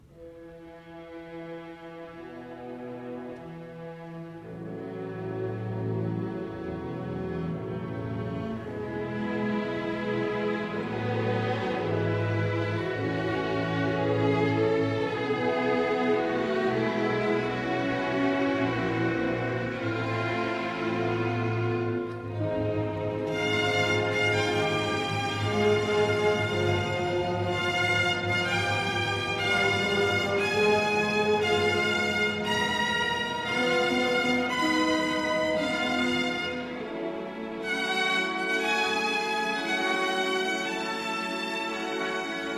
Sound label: Kaddish Mourning Andantino; Alei Dvai; Leaves of Sorrow